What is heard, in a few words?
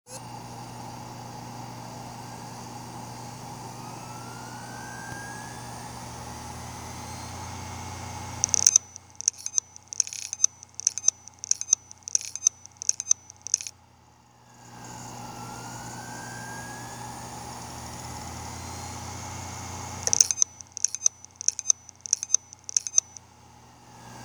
Samsung 1TB HD103UI